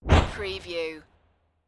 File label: Wipe